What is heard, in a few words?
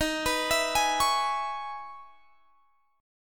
Db Minor 7th Eb